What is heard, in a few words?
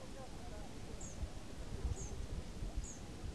hawfinch calls; Lingshan; nocturnal flight call; Beijing; nocturnal flight calls (NFCs)